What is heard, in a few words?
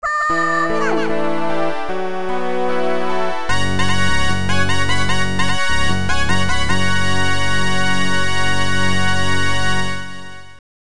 Homerun; super world stadium 92; namco system 2; arcade